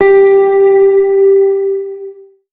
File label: resonator, Synths; Notes